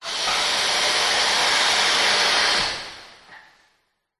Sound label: industrial pump air release hiss; zapsplat